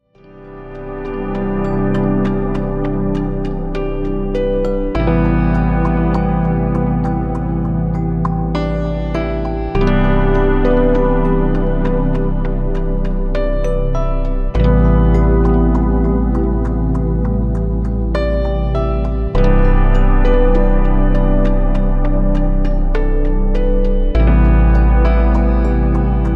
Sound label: Relaxing